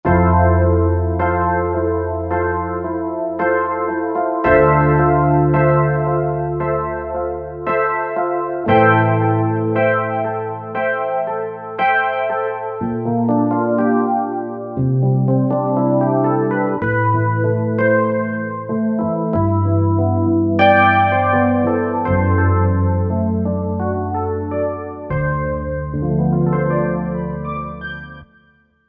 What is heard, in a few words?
KBed E.P., Korg TR-Rack